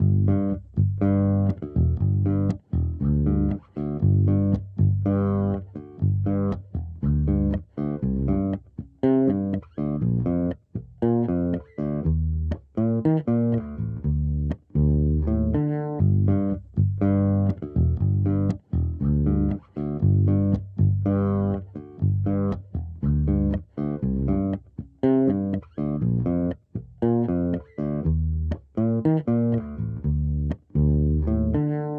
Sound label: BASSLINE; raji an ancient epic